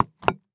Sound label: Foley Footstep High Heels Patio Stone Single Step; Foley Footsteps